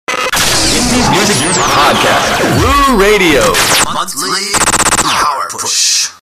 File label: jingle